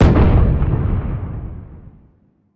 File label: Fireworks Blast; Angry Birds Seasons